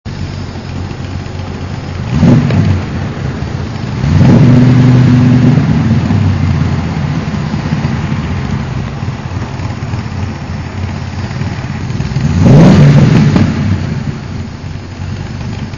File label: single, v8 mini